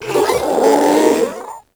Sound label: Strike Scream; Warframe; Proto Infested; Ancient; Abilities